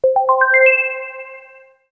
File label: Correct Tip